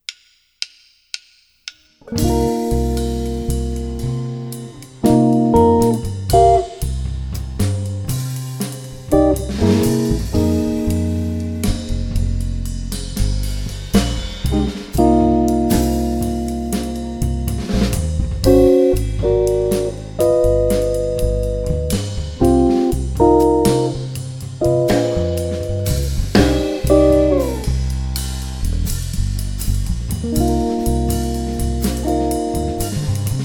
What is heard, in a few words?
Minor Blues II V I; Jazz Harmonia